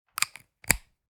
Headphone jack insert; headphones and speakers

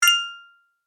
Bright success ding notification positive

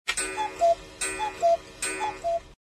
Cuckoo clock strikes with chime and cuckoo

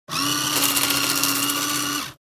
Casino slot machine ticket eject

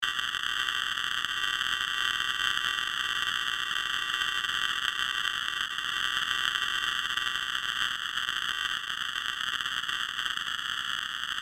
Radio static noise loopable

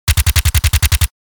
MP5 submachine gun short burst; war

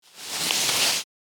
Nylon rustle movement; foley

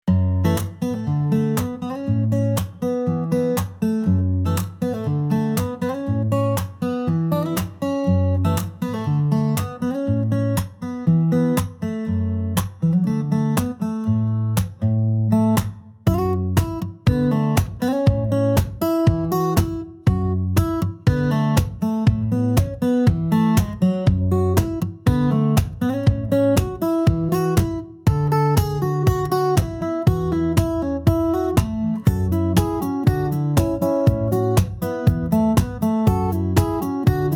Acoustic beat feel the groove

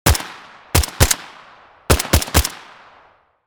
Tactical assault rifle multiple shots

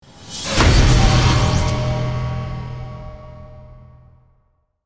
Buildup tension riser; transition

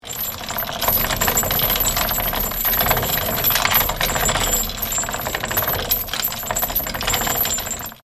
Metallic chain pulling rattling

